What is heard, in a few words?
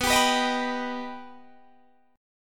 B Augmented B down Guitar Open D